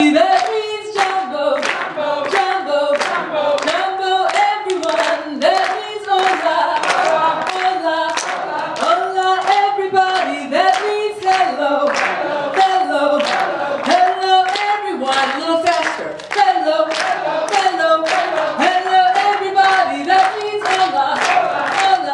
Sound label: audience singing hello; natalie daise; 2012 big story fest; artworksinbeaufort